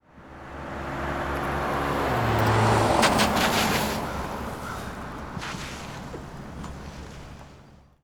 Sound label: cars; vehicles